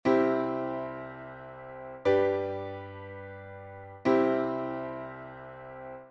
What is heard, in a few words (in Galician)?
Do M - Sol M - Do M